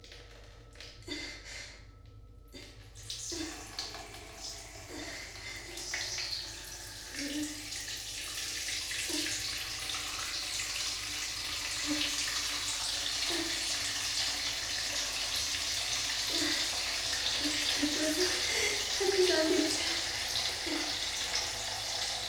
おしっこ お風呂場 洗面器 バイノーラル

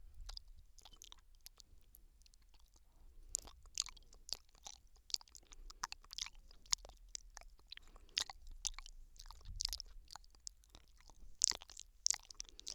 右耳アイス舐め

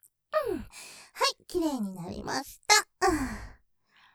お掃除フェラ; 仕上げ！きれいになりました♪; ステレオ

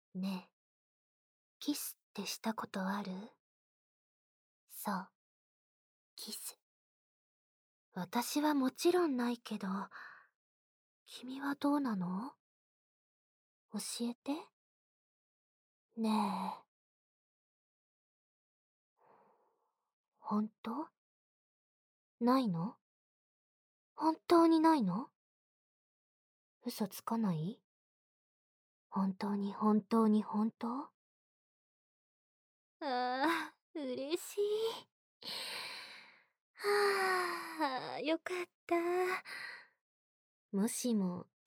キス～耳舐め～全身舐め～フェラ, ASMR

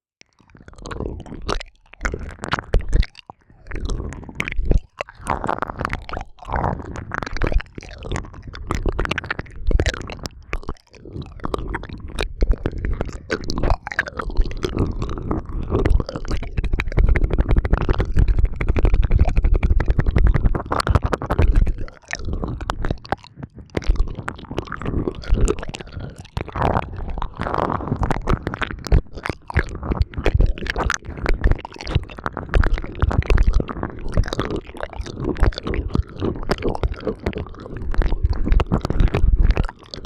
べっとり耳舐め 両耳, ASMR; 猫族の子

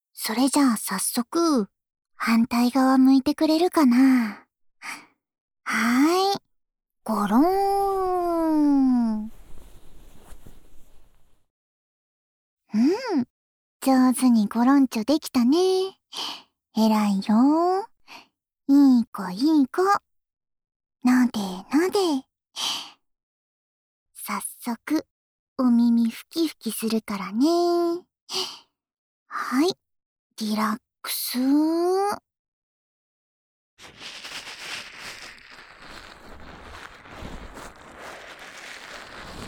耳クリーニング（左耳）, ASMR

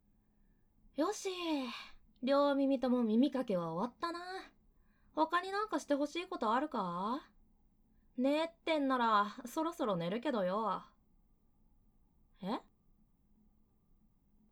右耳の耳舐め; 耳舐めってなんだよ！？

